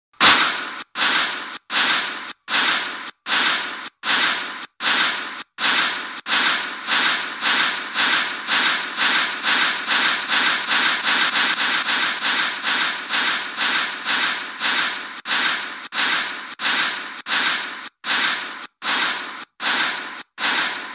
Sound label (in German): DAMPF; basic soundmodul fuer analog digital